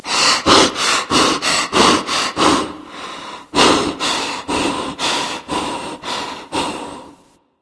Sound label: breath; smite; susano